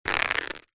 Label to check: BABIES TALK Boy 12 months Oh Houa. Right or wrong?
Wrong